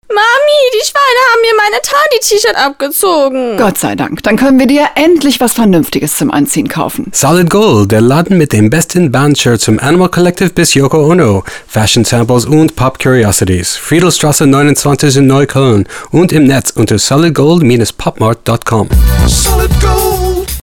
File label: funk